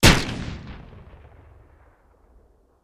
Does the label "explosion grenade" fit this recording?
Yes